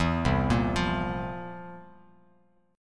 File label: C 11th, C, down, Bass Standard; C11 arpeggiated